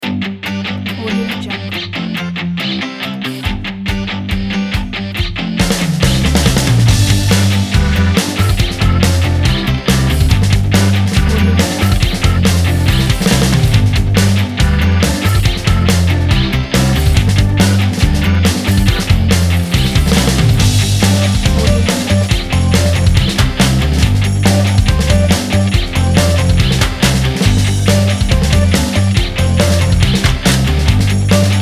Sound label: dynamic promo